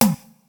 TEKNO; Rubber Chicken Software Sample Bank

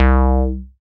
SYN TBSUB 3V, SYN TB-303; Sound & Vision - Gigapack I CD 2

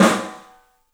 STEEL, Drums, ROOM SINGLE; 3D Sound

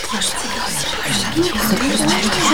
NOISE, CLASSIC VOX; Best Service; Hallelujah

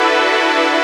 PAD, Techno Trance; Orbit Presets

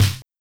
Linn, LinnLinn Drums

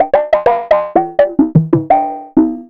ms20 raw congas

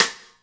SIDESTICK; InVision Interactive; Lightware VOL-1

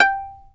fiddle pizz G5 L; Sampleheads; Whole Lotta Country 1 & 2

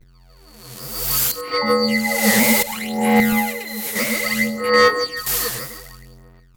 loop, techno atmos

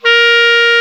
KLARINET, Alpine Volksmusik; Best Service

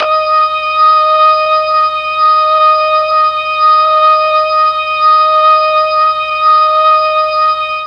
D#4 ORGAN, Mellotron MKII; Mike Pinder - Mellotron Archive CD

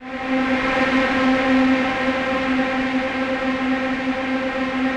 DEEP PAD C4 R, Yamaha VP-1; Music Store; 90's Sample CDs; DEEP-PAD 2

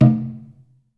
DRUM, Ritual Drums; E-MU Producer Series Vol. 7 – Old World Instruments; Drums&Percussion

